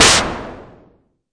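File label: mbrute noise, Arturia MiniBrute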